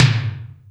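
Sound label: Lo Stereo, Alesis D4 drum machine tom; 300 Drum Machines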